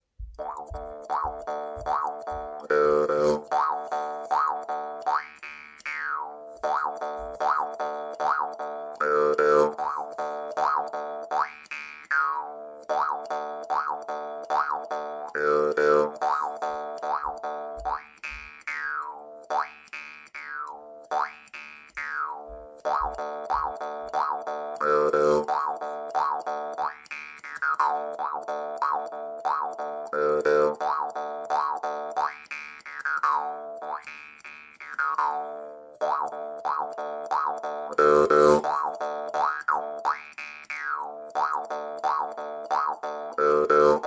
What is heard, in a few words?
jaw harping